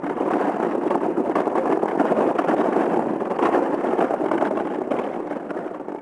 wheels; DAoC

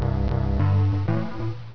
Filler Music; Space Joust

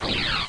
Close Window

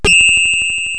ice

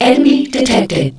enemy detect; StarSiege

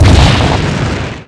Asteroid Explosion; swarm

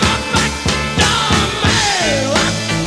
DOORS

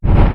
wings; Earth and Beyond; EA Games

